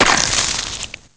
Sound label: ice, bullet impacts; chopper; Soldier Of Fortune II Double Helix; XBOX